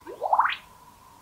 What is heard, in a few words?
xiaomi swoosh ringtone